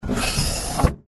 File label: door open; Danger Mouse - Ultimate